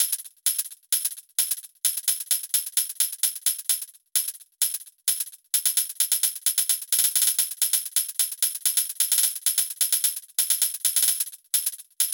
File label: Stacked Coin Tick; AnnasLab